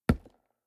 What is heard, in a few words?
lumber tree